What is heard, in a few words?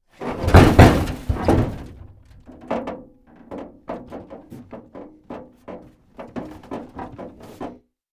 Vehicle Door Bang; MinorHallutinationsSounds1